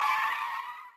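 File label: car skid very short; lightning-speed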